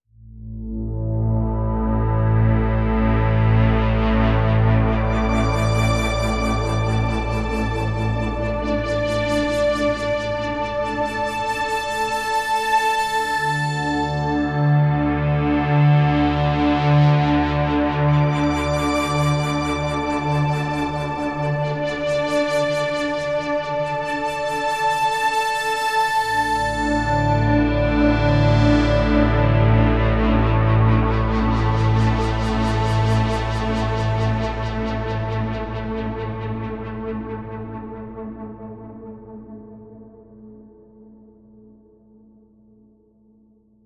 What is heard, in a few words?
Minds Spinning, drone; Roland JU-06; SoundDiviner Project; Roland JU-06 Pack08; digid drone pack